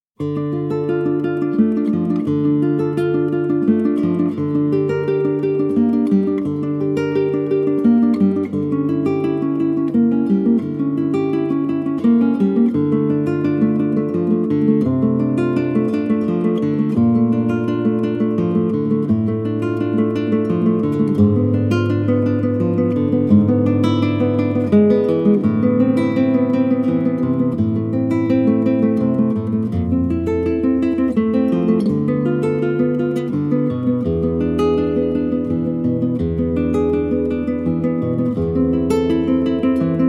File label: prelude in d minor